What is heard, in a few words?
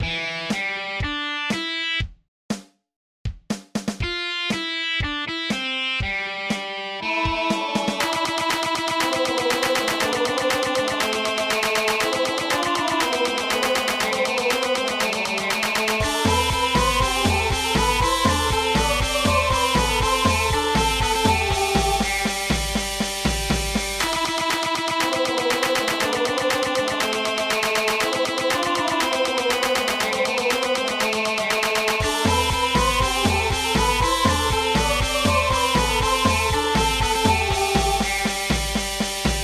Chorus Rock